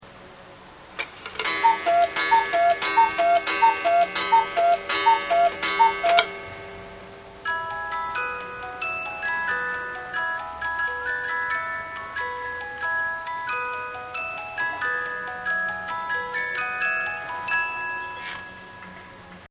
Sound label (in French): meca musique, coucou